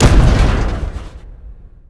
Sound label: shield; NanoFXv2; ExplosionFX; ExpShield